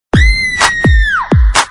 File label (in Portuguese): GRITO